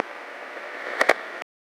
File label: a sound that feels very, skateboarding